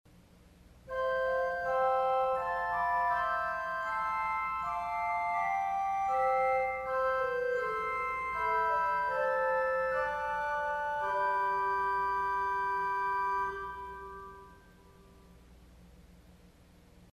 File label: Zauberflote St Anne, organ; CulverAcademy